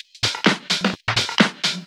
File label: Houseloops 128BPM; Vengeance Samples